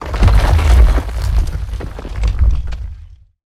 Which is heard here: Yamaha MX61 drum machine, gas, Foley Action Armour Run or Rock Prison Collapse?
Rock Prison Collapse